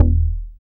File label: BAS MKS-50, Roland